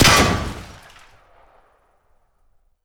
armor take damage; gmod; lvs framework

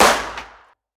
fire dist int pistol light; gmod; arccw uc commons attachments

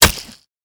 sharp light; tfa starwars melee weapons pack; nmrihimpact

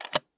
breaker open; gmod; arccw uc commons attachments

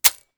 mech, 40mm; gmod; arccw uc commons attachments

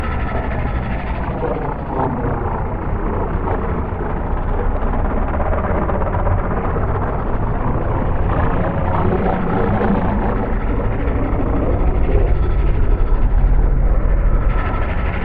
engine; gmod; lfs tx 130

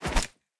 punch gun miss; gmod; arccw uc commons attachments

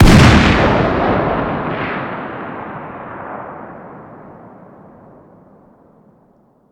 fire dist 50bmg rif ext; gmod; arccw uc commons attachments

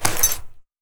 wrench draw; melee weapons; tfa starwars melee weapons pack

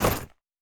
bat draw, metalbat; melee weapons; tfa starwars melee weapons pack

